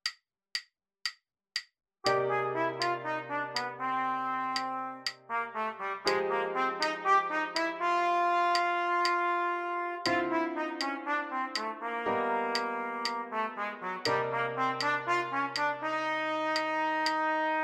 Melody filled with connecting enclosures

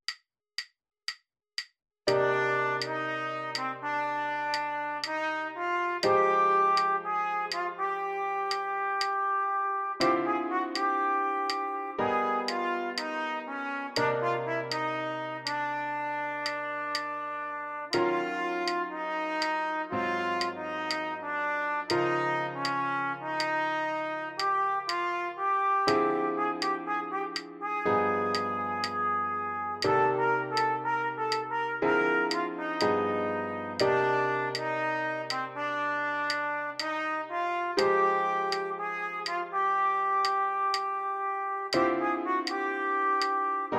tensions with approaches

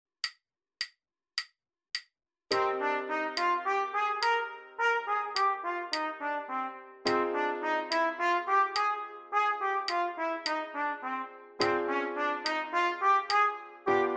inversions filled with scale tones

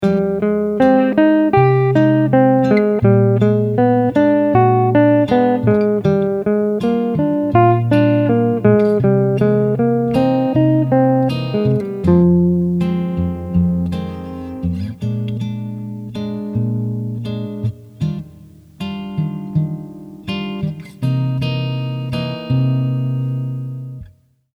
Arp inversions, first note stays G; Inversions with pivot

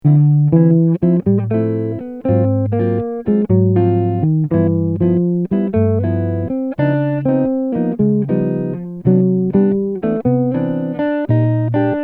With rhythm, 1 approachnote, II V licks

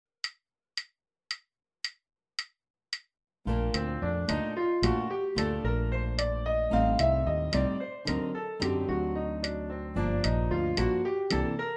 BH scales

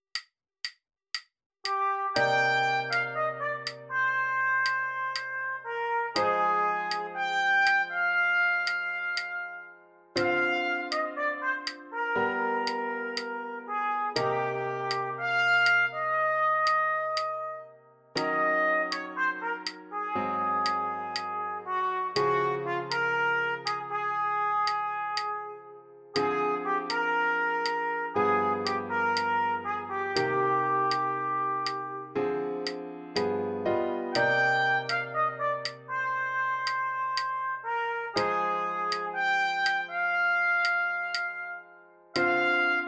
just melody

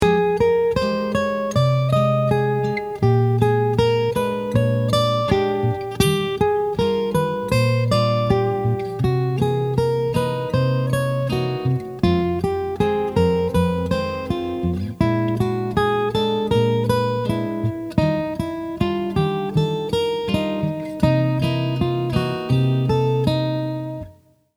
Us triads connected with approachnotes; Upperstructure licks

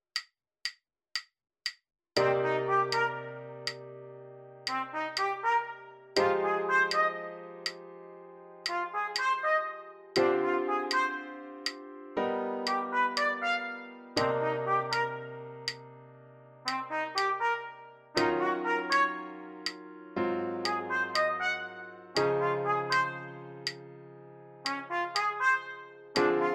Rhythm with 1,3,5,7

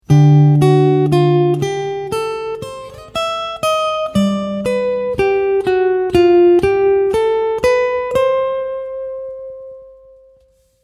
first note G

